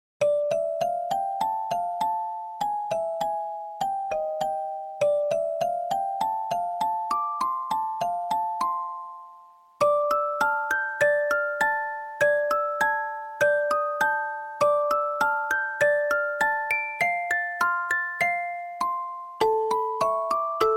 In the Hall of the Mountain King Arranged for Music Box; Grieg; Peer Gynt Suite; Short Version